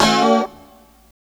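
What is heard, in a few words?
G#3 B mono slide R, guitars; Spectrasonics; Hans Zimmer Guitars VOL-1; 90's Sample CDs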